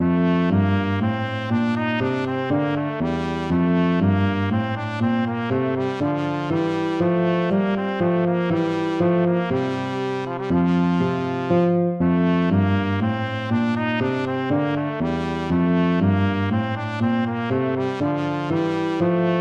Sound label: baroque minuet major